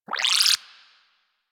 Sentient Trooper Link Beam Start; Warframe; DE; Sentients

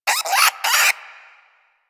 Vocal Alerted, Hunting Critters; Warframe; Cryptilex

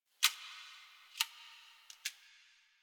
Computer Room Floppy Disc

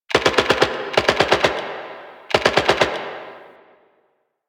Distant Gunfire; Warframe; Ambience; Nineteen Ninety Nine

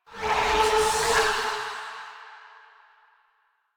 Generic Foley; Warframe; Choir

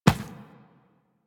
Infested Medium Run Default, Footsteps; Warframe

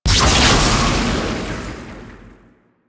Orb Spawn, Medusa Puzzle; Duviri

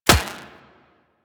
Kinetic Plating Shield Hit; Warframe; Runner

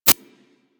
UI Common Dialog Open; Warframe

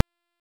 Stag Wild Exclaim Summon; Powers; Stag Pact

